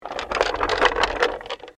Cart Roll By Fast, Industry, Cart, Roll, By, Fast; Rolling; Objects

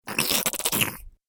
Cartoon Gremlin Slurpy Eating; Cartoon Voice

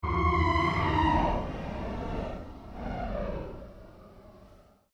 Created Cyber Whale Call, Alien Sound Effects; Created creatures, vocalisations and pretend speech

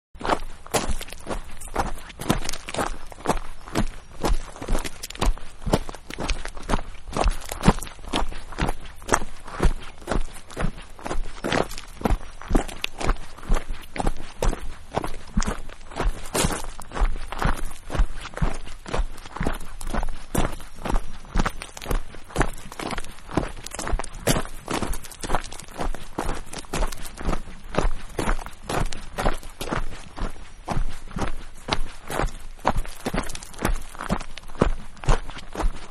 Walking On Stones Dirt; Walking-On Stones And Dirt; Footsteps; Human; Manhattan Production Music

